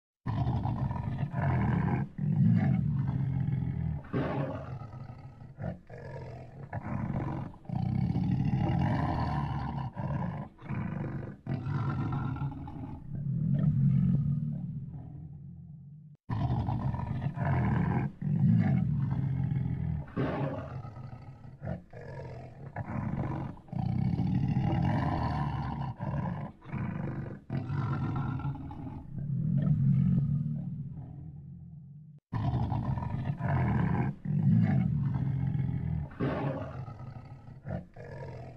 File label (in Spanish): Sonido 3D León Rugiendo; Holofonia